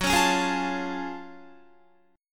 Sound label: Gb Minor Major 9th, Guitar; strummed; 4F,4A,5C,5G,5C,6E; 13,16,13,13,17,17